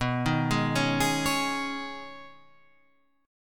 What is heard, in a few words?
B 6th Add 9th B down Guitar Half Step Down